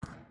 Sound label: footstep; The Mercenary Chronicles